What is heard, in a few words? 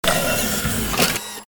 electric door